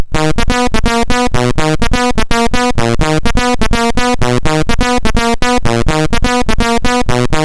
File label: Bass Sample, MOS6581R4